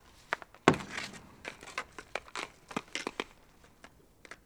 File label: Hockey Puck Handle; Sports & Recreation